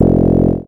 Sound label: Time FAIL